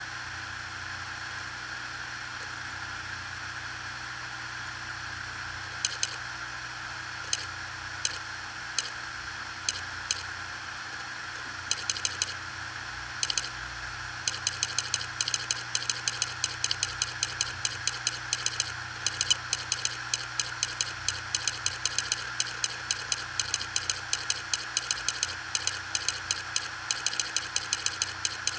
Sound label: Clicking, WD WD20SPZX, HDD